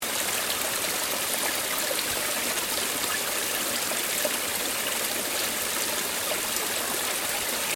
Stream gentle loop, Stream: gentle water running, loop; Nature; Stuart Duffield